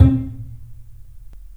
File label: PLUCKS, The Piano; InVision Interactive